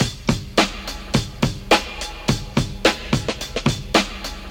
107 bpm high quality drum loop sample b key, Free drum loop - kick tuned to the B note